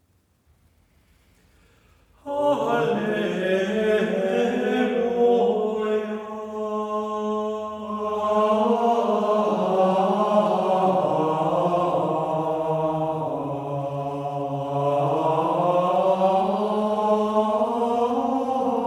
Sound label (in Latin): Alleluia Angelus Domini à deux voix